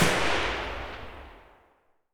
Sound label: ak105 distant loop tail; Escape from Tarkov